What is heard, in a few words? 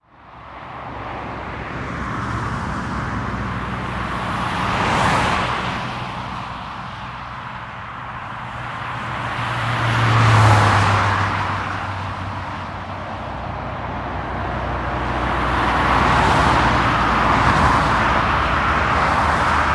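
ambience urban traffic motorway medium quiet; sound effects one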